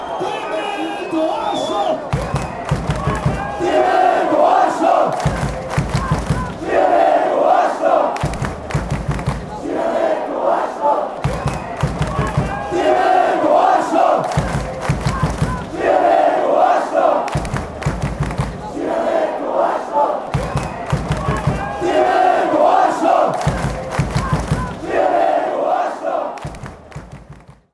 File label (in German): schiri du oschloch, chants